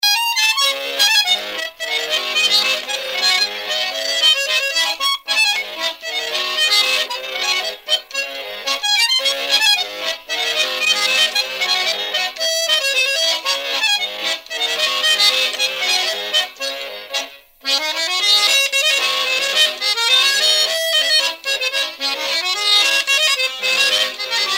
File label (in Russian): Karelo finskaya polka